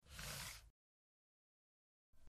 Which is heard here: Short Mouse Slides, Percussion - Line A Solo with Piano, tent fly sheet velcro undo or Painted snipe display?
Short Mouse Slides